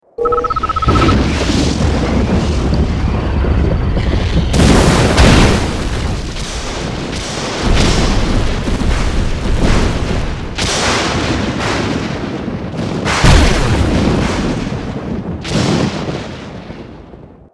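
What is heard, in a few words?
lighting storm active; red alert2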